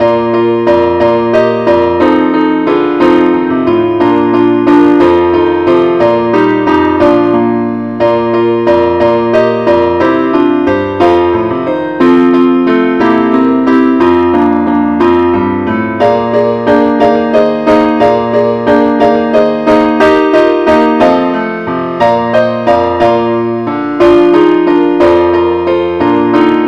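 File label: keyboard only key B flat; angelvoices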